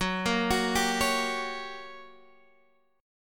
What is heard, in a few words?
G Minor Major 7th Flat 5th, Gb